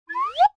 cartoon slide whistle ascend version slow

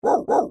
dog woof

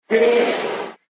ankylosaurus

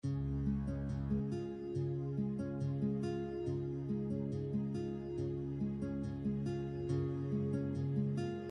menu bgm

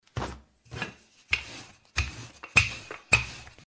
food dough

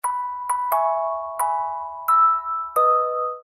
Happy Birthday to You short

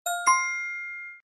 correct answer sound effect ding

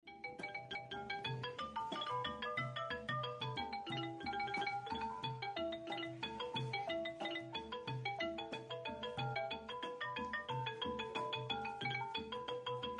cambodia bgm

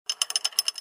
just chains pull chain hoist; soundbits

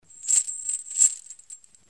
metal Chain

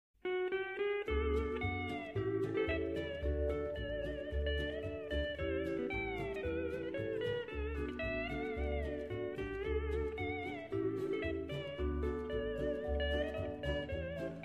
bgm hawaiian

